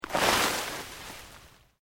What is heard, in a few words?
PLANT LEAVES LARGE MOVE FAST, rustling; Material components for constructing more complex sounds